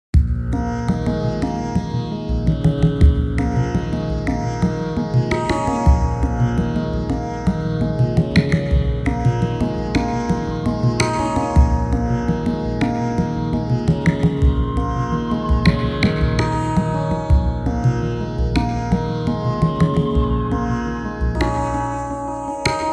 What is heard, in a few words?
tamboura